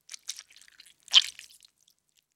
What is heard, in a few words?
Blood Flesh Squish; Fairlight Sound Lib